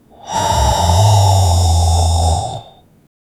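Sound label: single very deep heavy breath, gasmask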